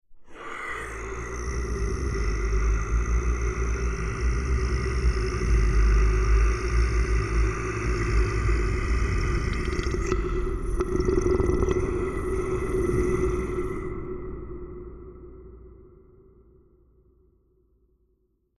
monster growling

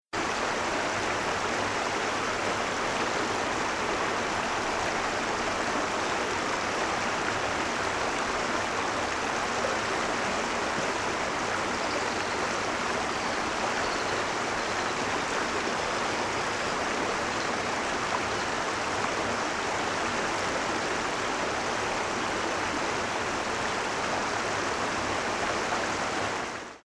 river

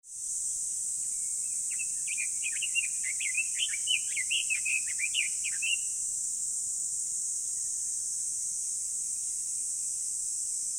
morning countryside

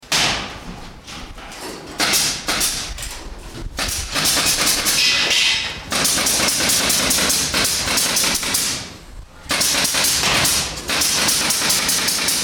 construction site

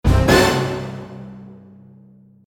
surprise

